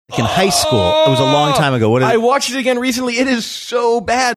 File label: aaaaauuggghh; i watched it again recently